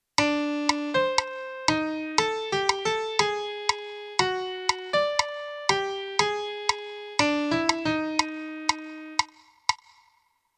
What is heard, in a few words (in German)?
Melodie; theorie; Melodiediktate